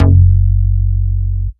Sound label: Root F#, Bass; Vengeance Samples